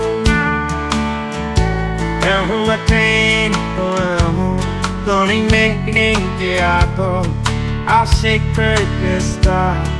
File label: country; musiclm